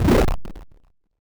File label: spawn; avoid the spikes